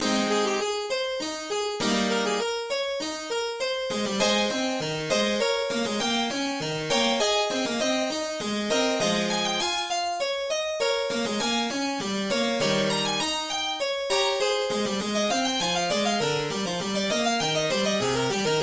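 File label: No17 in A Flat Major, MIDI; BWV 862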